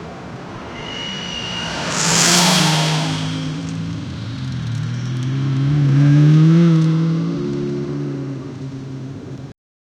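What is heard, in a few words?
stuck in traffic continuous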